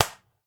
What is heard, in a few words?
clap; smite; gilgamesh